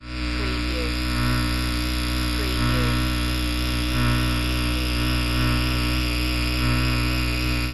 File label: Electric High Voltage